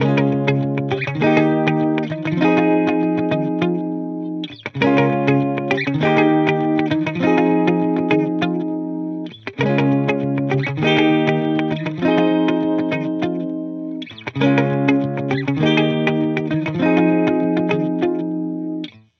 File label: guitar loop 100 Fm; Pop; Guitar Stacks; SD PASADENA Pop Sample Pack; Slate